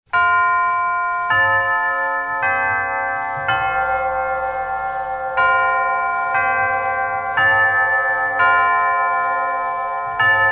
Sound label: westminster chimes; iradioalarm